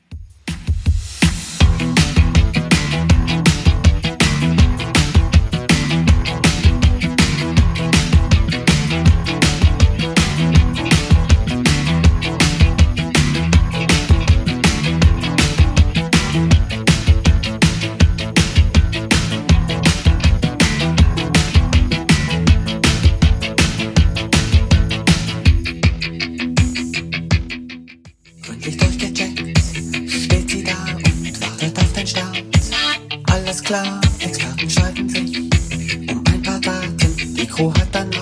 80s Clubmix 161bpm

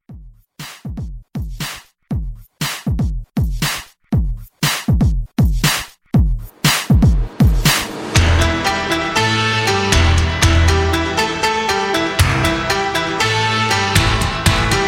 80s Re-Drum 119

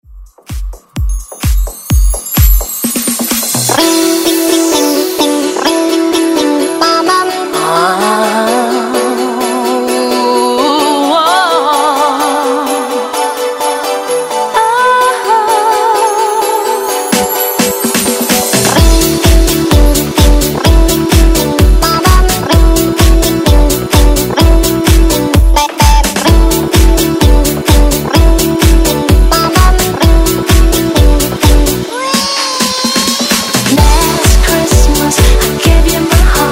Xmas House; Redrum

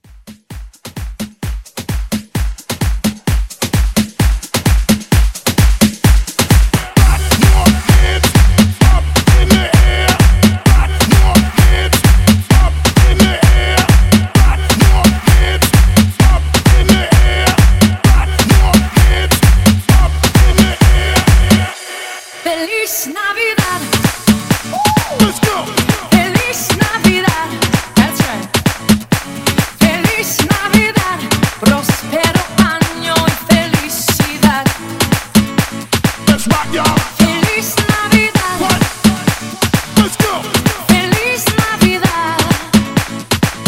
Christmas Club

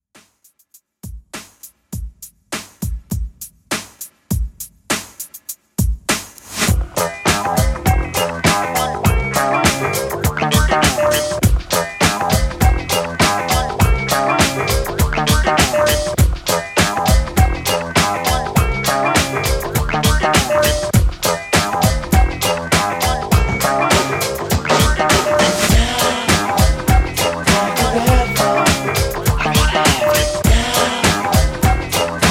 90s Hip Hop Redrum 101 Bpm

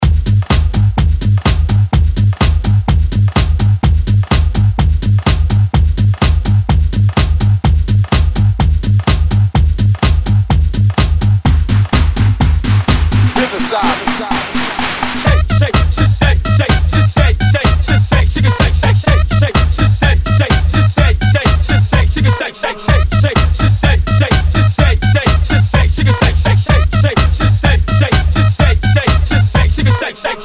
Hype ReDrum 126 Bpm

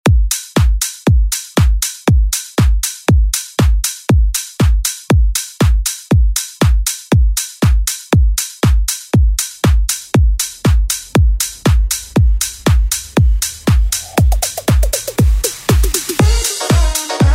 Pop Redrum; Deejay Hit

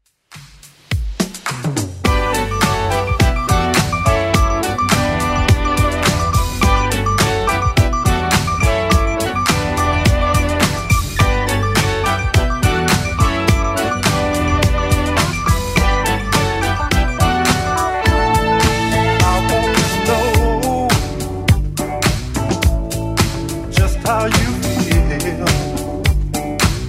80s Club Edit 105bpm

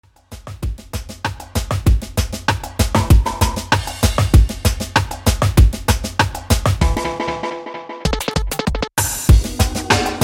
80s Reggae 97Bpm

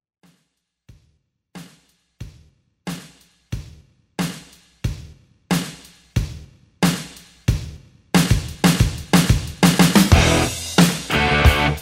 80s Rock; 80s Rock Redrum v2

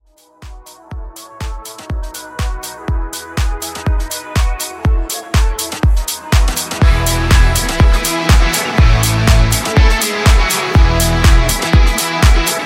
House Edit; Remixfreakz

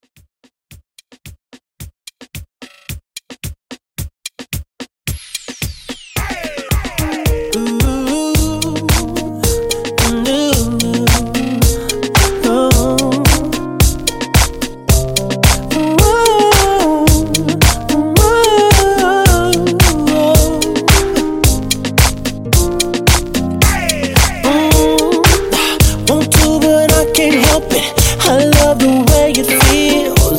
Dj Intro Outro Reggaeton 110 BPM

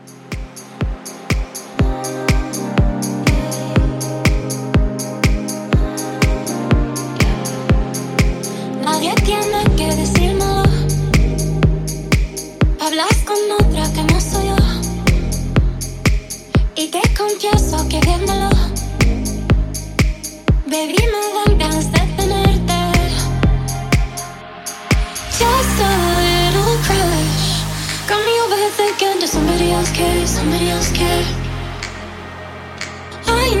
Love Club Redrum 122Bpm

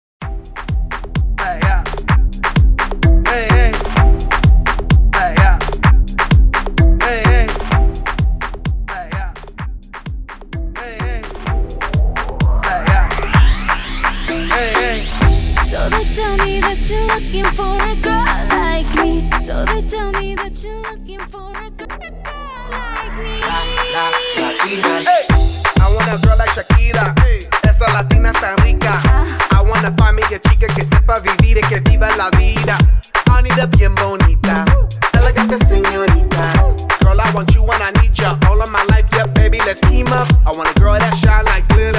Top 40 Redrum Up Tempo 128BPM

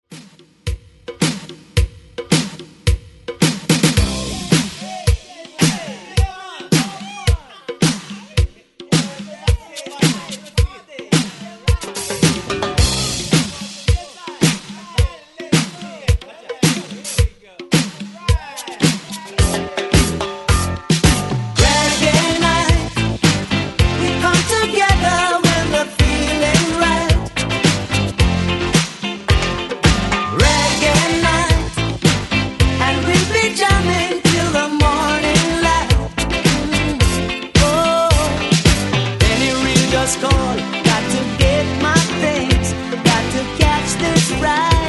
Reggae Intro N Outro

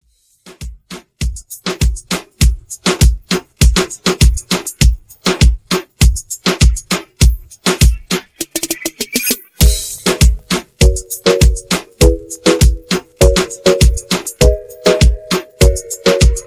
Hype 100 Bpm; remixplanet

